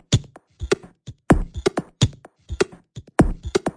127 bpm microscopic analysis, drum loops and breaks